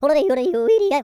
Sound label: YODEL; ILIO; Vocal Planet